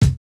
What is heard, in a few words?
kick, house